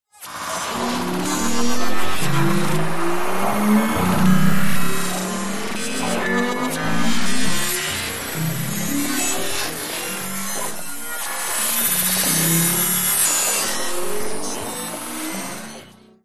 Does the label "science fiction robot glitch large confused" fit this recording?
Yes